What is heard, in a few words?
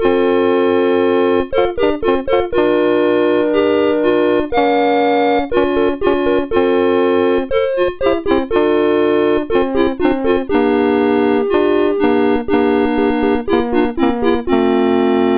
Pong BASIC, MOS6581R4; DEMOS